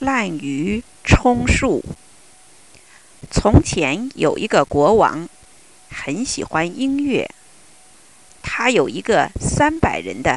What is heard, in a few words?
flute